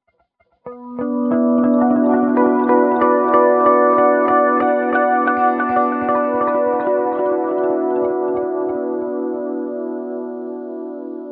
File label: G steel